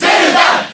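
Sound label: Cheer Japanese, crowd; Zelda; Brawl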